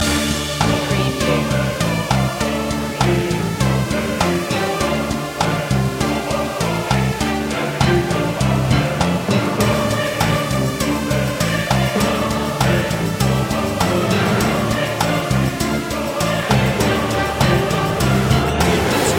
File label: spooky house loop joiner; media music now